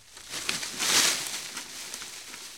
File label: bushes; Zomboid 41.78.16; Project Zomboid